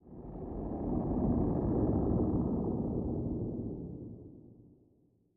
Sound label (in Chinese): 诡异森林出现声; ambient